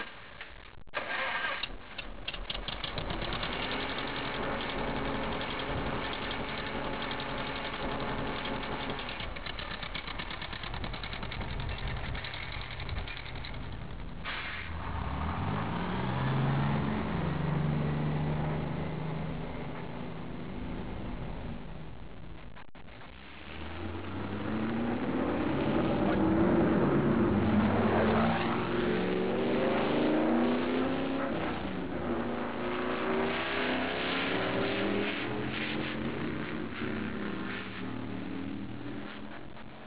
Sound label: bus; Golden Record